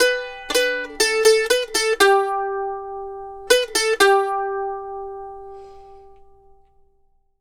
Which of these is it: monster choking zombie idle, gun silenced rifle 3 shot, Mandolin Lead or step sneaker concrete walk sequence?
Mandolin Lead